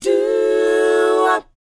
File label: DOWOP B, Vocal R&B DOO WOP; ILIO; Vocal Planet